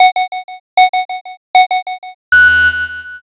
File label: countdown